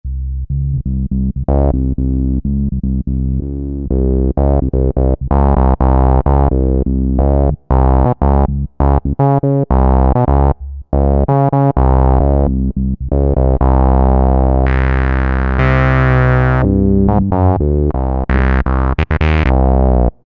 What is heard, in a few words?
Gate Me Buzz, Roland SR-JV80-11 Techno Collection